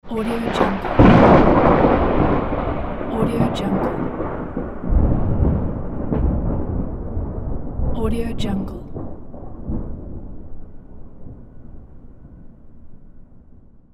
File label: thunder strong no rain